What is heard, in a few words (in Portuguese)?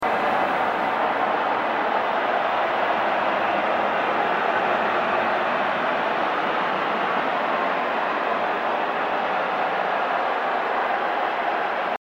som torcida fundo